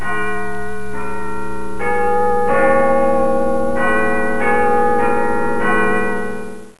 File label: BIG BEN; special effects